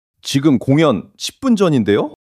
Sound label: surprise